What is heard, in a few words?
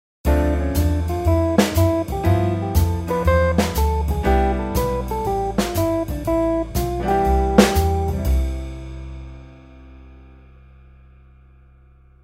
Scale; Tomosax